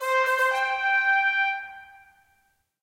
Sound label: WINNING; To the Moon